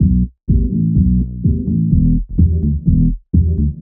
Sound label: 126 B E BASS, Funky Underground bass loops; Loopmasters; Funky Underground Vol 2